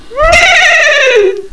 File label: horse whn